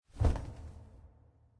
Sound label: flap; smite; kukulkan